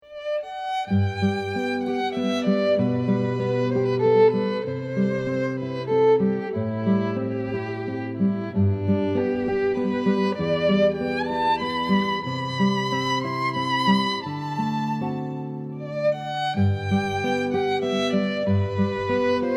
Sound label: violin and guitar duet